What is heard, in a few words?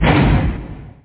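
elevator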